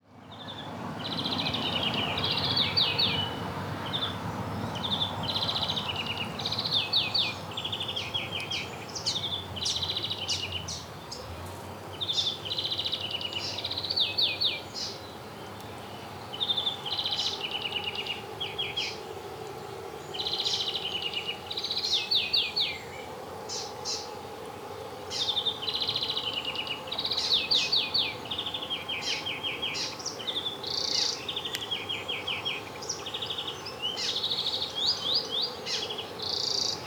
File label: Chloris chloris; SETE